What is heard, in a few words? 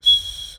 Birdwhistle, clay, painted, dry, airy, wispy, single, strong, sharp swell, fade; Eiravaein Works; Vaeyan IV